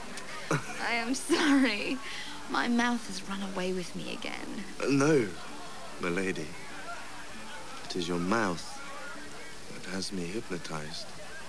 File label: mouth